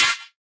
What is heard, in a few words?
stabs hits